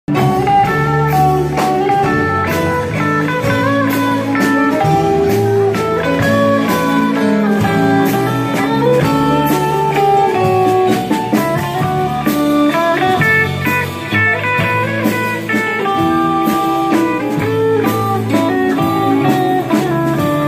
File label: cover band